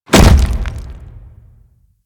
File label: Golem Step Default; Warframe; Footsteps